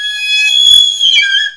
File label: sf doble, flautae